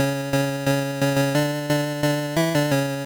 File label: Fanfare; Arch Rivals; Game Gear